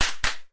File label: cracker